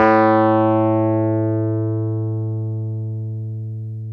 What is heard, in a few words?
Wurly, Keyboards of The 60's and 70's